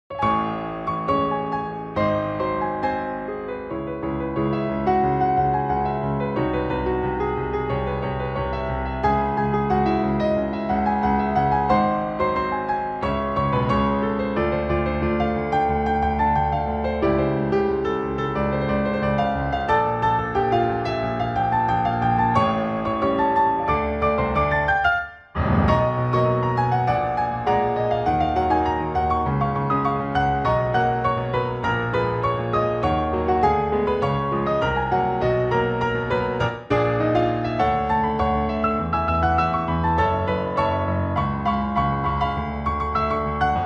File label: piano ver.